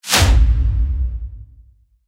Aftermath Hit Powerful Damage

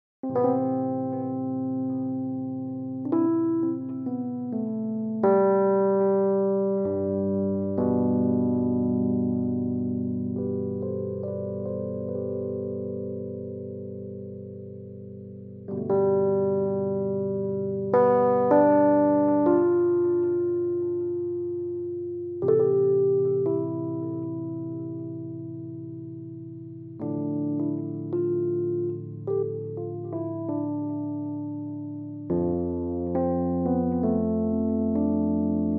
Rhodes 73; Epia; Kontakt; Loot Audio

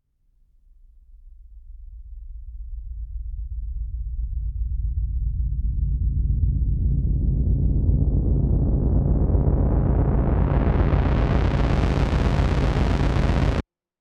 Long Rise Machine

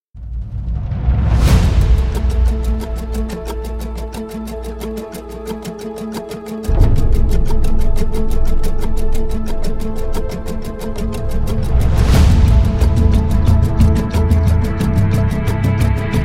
uke

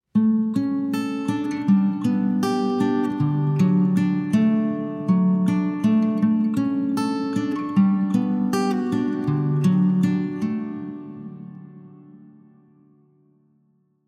Guitar; Co Cathedral of St. Alexander; Soul Samples

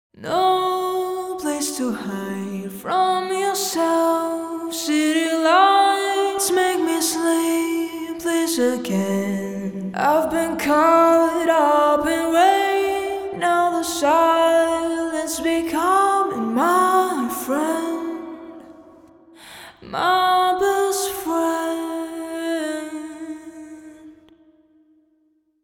Vocal St. Andrews Church

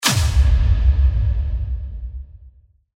Hit; Aftermath; Zeitgeist

